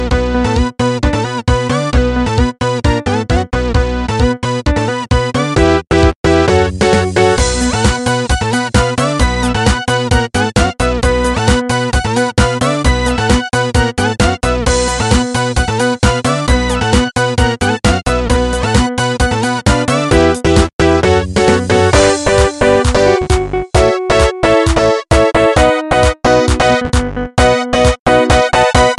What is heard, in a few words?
Party Time Tone